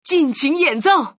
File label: rocktopus sample jazz